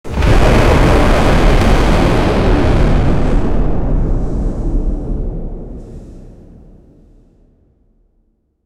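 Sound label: scary world hell like with, volcano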